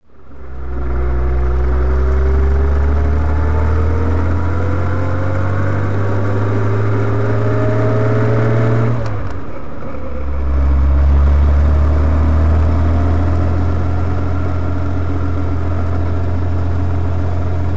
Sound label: etype drive; etype 42ots drive